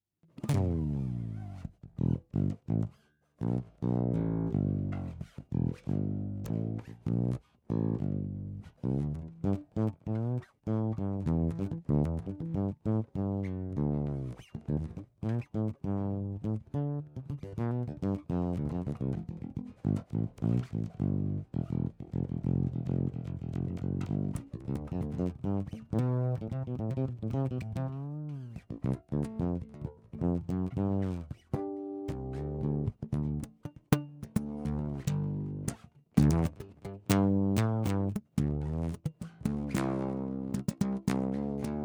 warmoth fretless piezo, bass

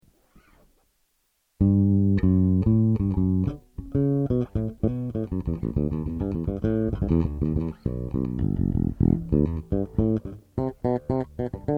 VINT JB bridge BASS; electronics

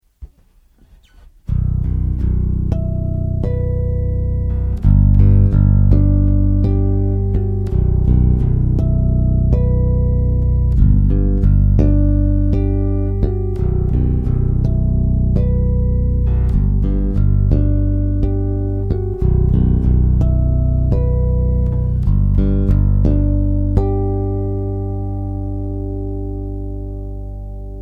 Surine piezo, bass

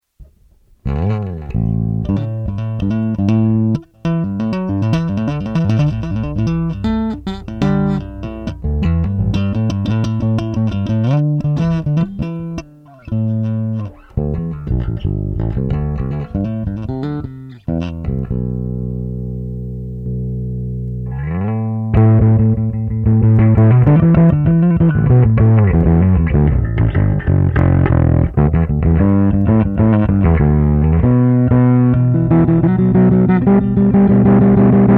JB neck, bass; electronics; FLIP TOP

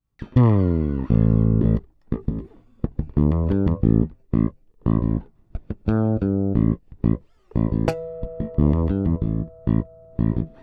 Warmoth Duo, bass

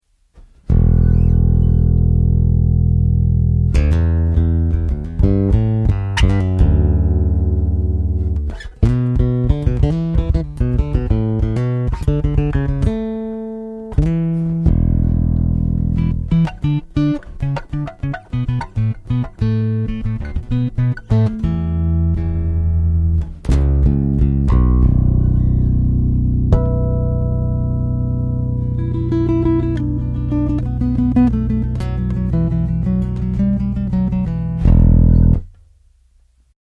Surine piezo, bass

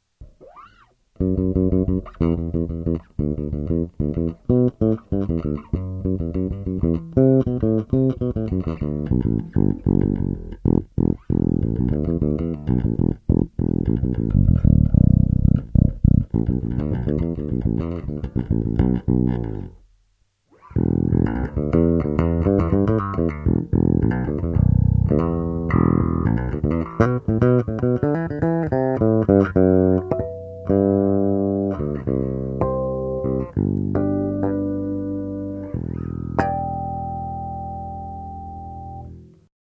ACTIVE bridge, bass; electronics; SESSION

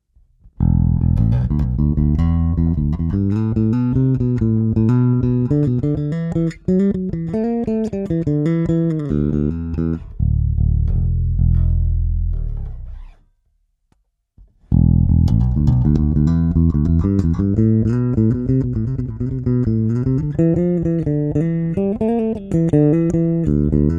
pickups test both, bass; pickup wiring

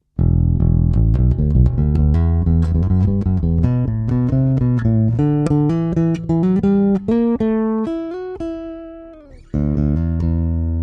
pickups test neck, bass; pickup wiring

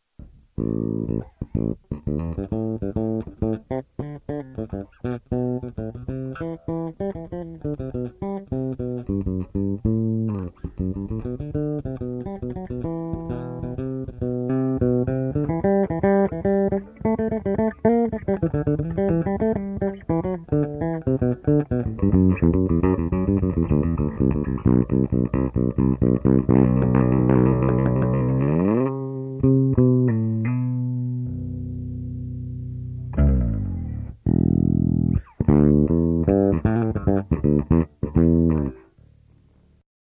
JB bridge flip top, bass; electronics

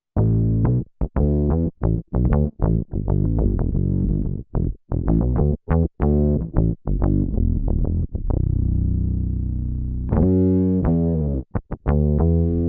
synth, bass; electronics

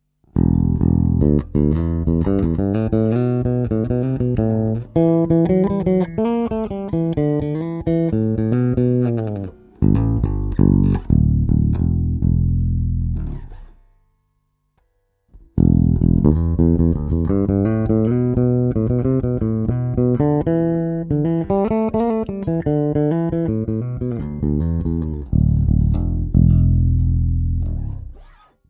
pickups test bridge, bass; pickup wiring

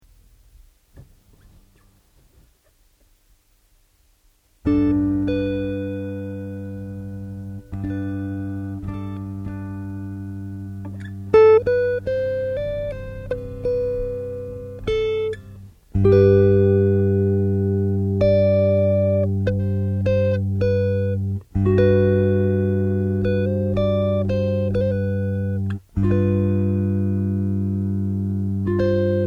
Chords, bass

